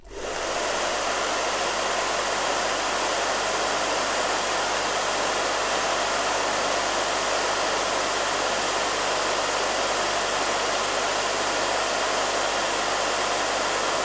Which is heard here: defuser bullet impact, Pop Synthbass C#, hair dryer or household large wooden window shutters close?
hair dryer